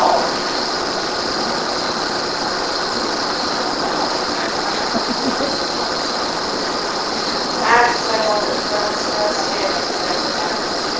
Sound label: Master Bed Room Unexplained Sinister Laugh AMPLIFIED